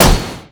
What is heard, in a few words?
Mace Metal, Damage